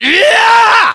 melee attack; english

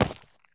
sprint slate; Escape from Tarkov

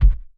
damage hits explosions

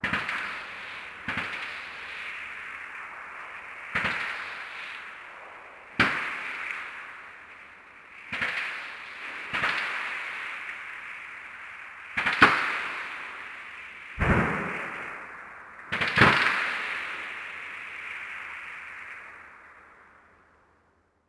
automatic, combat atmosphere; World of Tanks Blitz

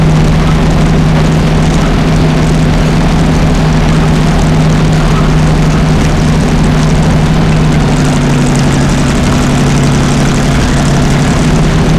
panther engine int rpm load, engines tanks; War Thunder

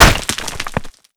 rock fall break softer; Explosions

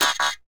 relay denied

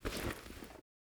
ak74u deploy; Rust

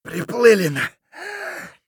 hurt near death; Escape from Tarkov; scav6

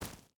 footstep barefoot gravel run; Rust

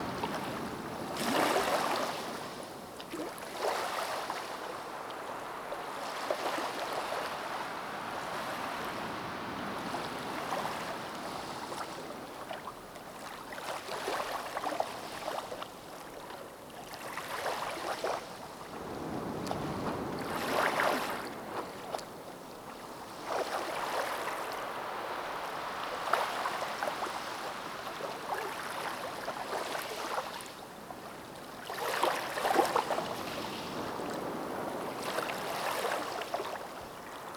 ambience surf water; Rust

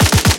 loop l21a1, guns tanks; War Thunder

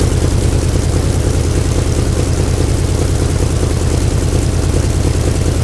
hellcat side, engines; War Thunder

